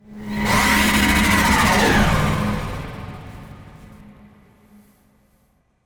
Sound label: Apocalyptic Riser Ambient Transition